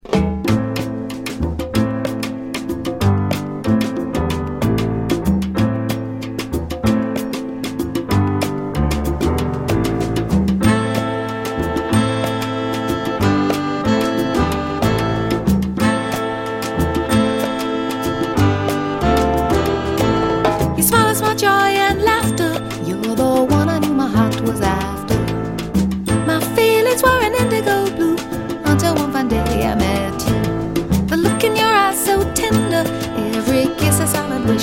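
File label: vocal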